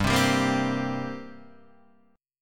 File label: G Minor Double Flat 5th, G, Guitar, DADGAD